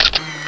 cam click

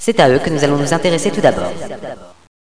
kit; Villagina; ecole

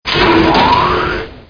elv start; TNP demo

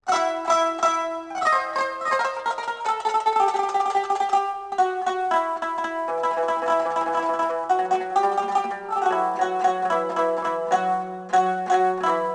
QANUN

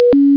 Pinpon; Metal Knights

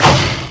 din; Windows Surprise 3; bermuda

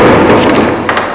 Trap Ship; Crystal Crazy Demo; Casady & Greene; Nasties; Shipnapper

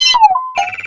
robot hit; Earth and Beyond; EA Games

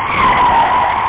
Screech; skidmarks v2.21 update

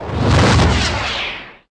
whoos

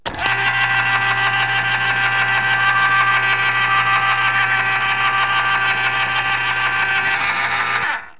Cat Food (Yum!); Household; Foods

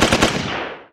machinegun; Fx; willofsteel

